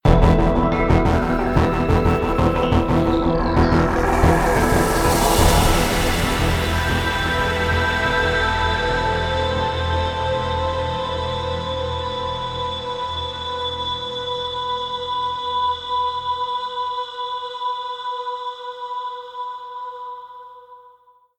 victory; mechs mercs black talons linux macos windows gamerip 2015

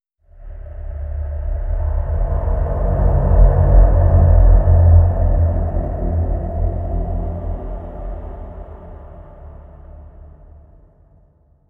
environment graveyard stinger winds; Aragami

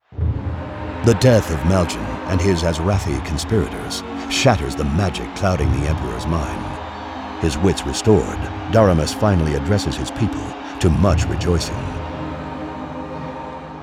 Cinematic; The Shadow Sun

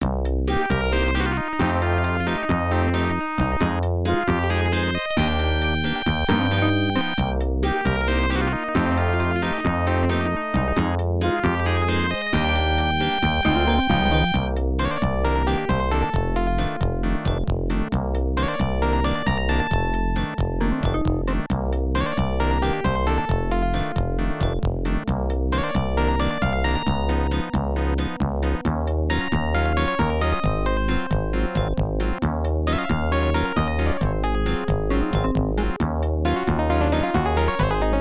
Wine Glass; Birdie Try

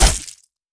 archer bow hit; Aragami

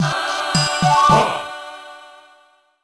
End Level

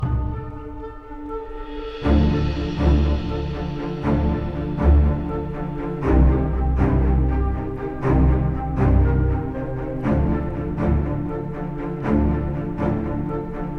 Battle normal loop, RPG Fantasy Music; Sofiya and the Ancient Clan; PS4, PS5, Switch; RPG Fantasy Music Pack

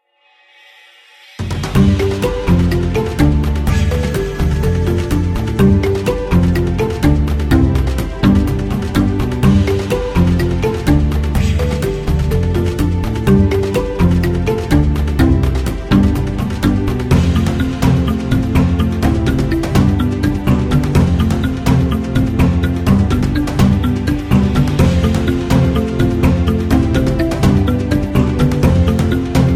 Sting

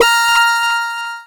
Text Feedback

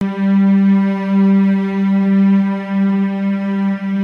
Strings; zip zap; Android

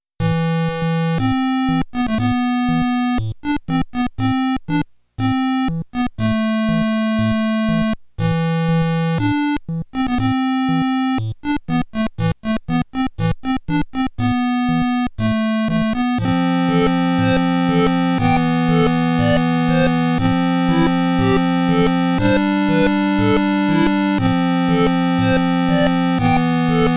Time Slip; Retro Game Crunch

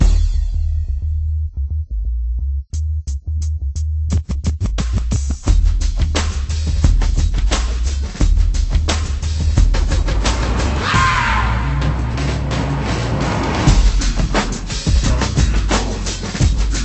Menu Loop

